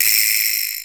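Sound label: SP vibraphone; Crypton - DrumBase5000; SP1200 PERCS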